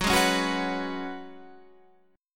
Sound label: Gb Suspended 2nd Suspended 4th